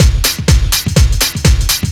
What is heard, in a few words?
thump march